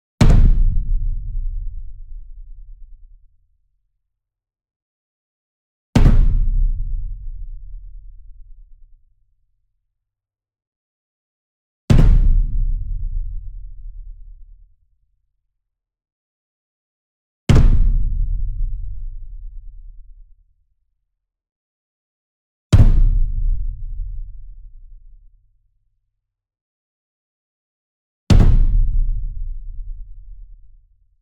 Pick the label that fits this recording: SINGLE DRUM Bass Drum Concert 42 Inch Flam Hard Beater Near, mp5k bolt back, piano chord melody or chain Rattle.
SINGLE DRUM Bass Drum Concert 42 Inch Flam Hard Beater Near